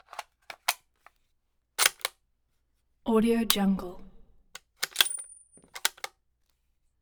Tikka lite mag in shell eject

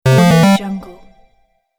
multimedia 8bit interface notification

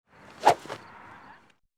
swinging golf club with no ball; sound-ideas

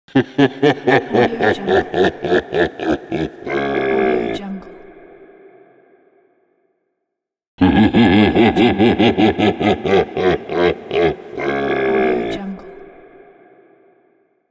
evil laugh

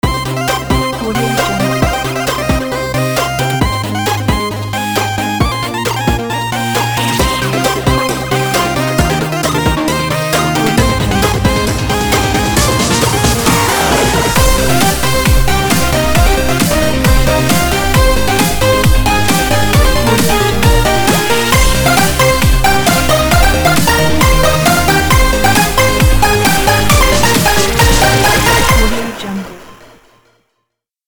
8bit chiptune logo opener

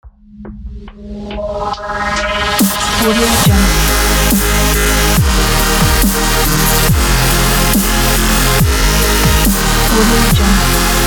bright pumping dubstep logo

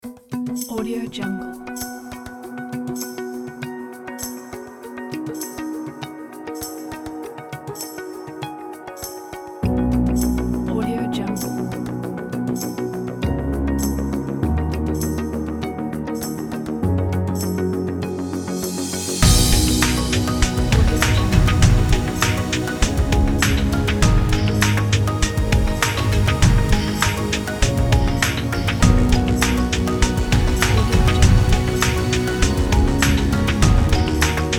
ethnic ambient

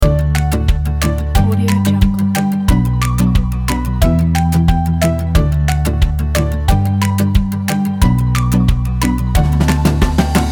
happy upbeat

